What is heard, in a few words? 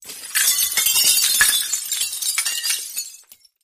Glass Falls Medium; windows & mirrors